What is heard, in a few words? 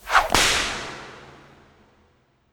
Slave Whip; Legend of Keepers (gamerip) (2019)